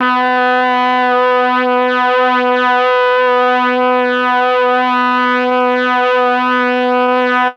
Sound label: MEL BRASS; ILIO; Propeller Island; Legendary M400